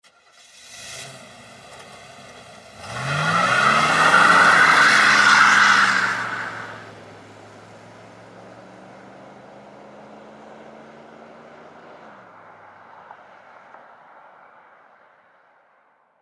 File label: ford cop start and leave